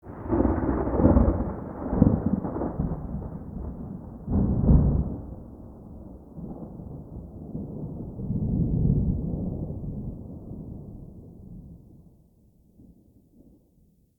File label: Night Rolling Thunder; Night One Offs; Ambience